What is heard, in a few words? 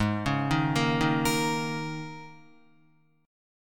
Ab Suspended 2nd Suspended 4th, down, Guitar; 4F,4A,5C,5G,5C,6E; arpeggiated; JGuitar